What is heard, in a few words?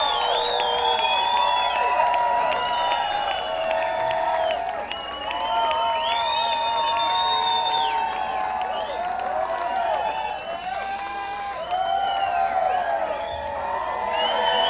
Encore Break